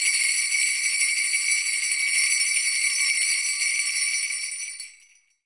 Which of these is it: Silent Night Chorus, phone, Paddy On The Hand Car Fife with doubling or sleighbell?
sleighbell